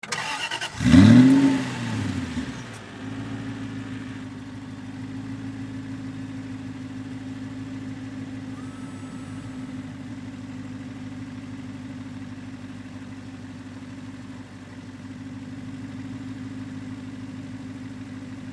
Auto Aston Martin Start Up Idle Shut Off Rear Perspective Automobile Car; Vehicle